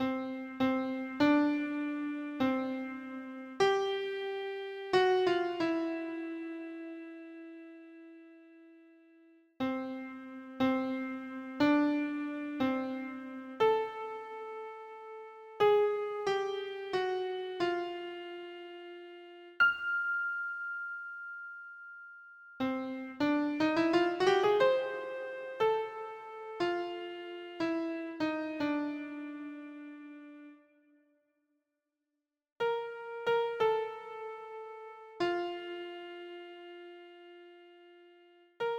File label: happy jazzy birthday keyboard